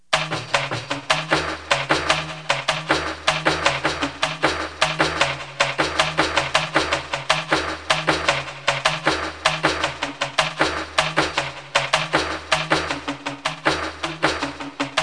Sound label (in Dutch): Trommel; Sound Effects Deel 1